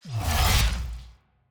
Exhaust Crystal Activation; TANK; 3Souls

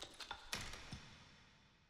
ambience room debris; necromastery

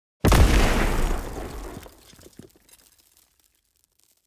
zone explosion

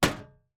object drop metal

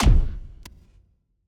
heart damage kick

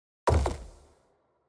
ui battle begin low layer

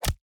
mob damage

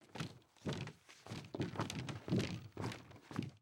ambience room shadow room working layer

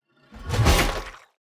fallen angel strike

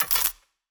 forge weapon buy; Shelter

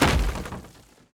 environment object chest breaking

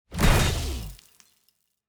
Orbital Sword AoE Hit; TANK; 3Souls

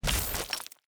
gold block destruction stone

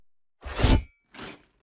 npc crusader strike

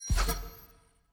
environment mob level up

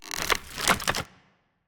tree golem little strike; Shelter

